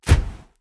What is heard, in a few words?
fire blast spray heavy with sparkles; soundmorph